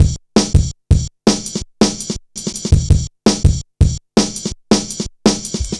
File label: heavy ridez, drum loops; Zero-G Jungle Warfare 1, 2 & 3